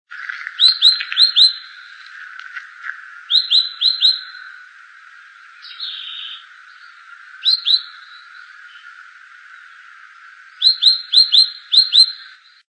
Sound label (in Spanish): Tacuarita azul